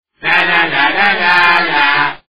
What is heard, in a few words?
hehe